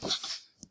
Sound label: jump; Android Games: Midori; Jogos Digitais